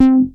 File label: Bass Synths hits and octaves